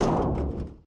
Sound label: agricultural tower grappler stop; Factorio